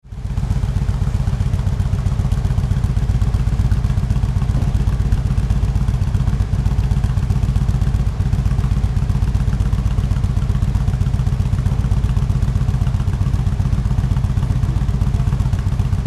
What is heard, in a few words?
Harley Davidson